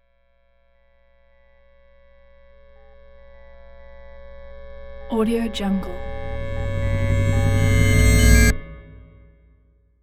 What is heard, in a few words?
futuristic rise